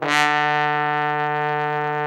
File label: TROMBONE; Best Service Gigapack 1